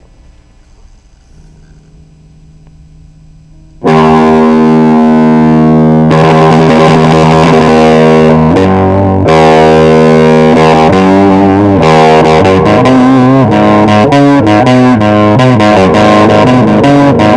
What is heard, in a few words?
blues